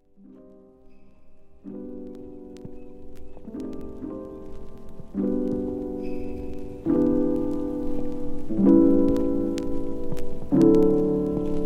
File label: Lofi Jingle Bells; موسیقی بی کلام لو فای برای کار کردن; lofi at work